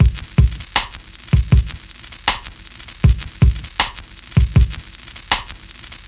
Hip Hop and R&B Drumloops; Best Service; ProSamples vol.01; AKAI

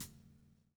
SLICK, Backbeat

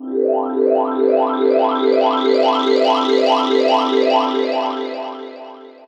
Pad; Dream Zone

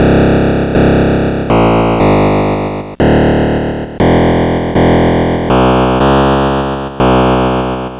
Atari 2600 Bass, chiptune

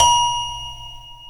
B 4 TOY PF, TOY PIANO; AKAI S6000 CD-ROM - Volume 1

